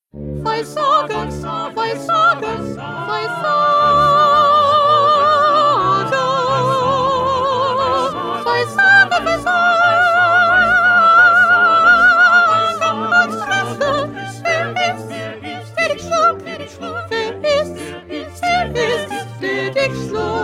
1st Soprano; Bach; St Matthew Passion

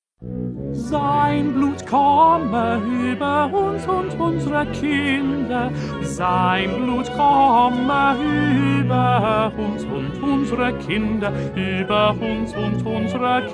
Tenor; Bach; St Matthew Passion

